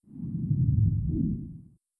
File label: Windows Beta Shutdown; Windows Never Released Soundpack 1